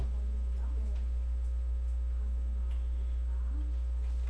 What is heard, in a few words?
knife